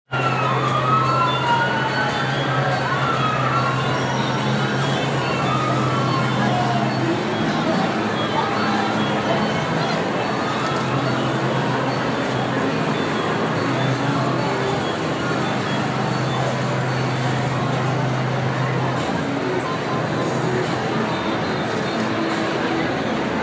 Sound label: street sounds; sxsw